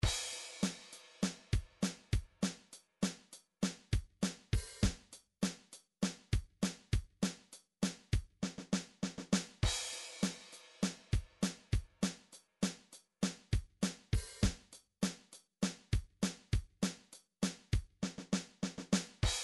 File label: drums, midi drum patterns